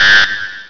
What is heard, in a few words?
BEEP; XWINGTIE